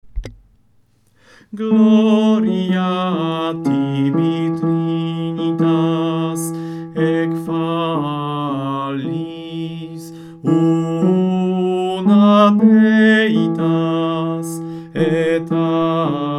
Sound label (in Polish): baryton; Gloria Tibi Trinitas Psalm; pasyjne; meskie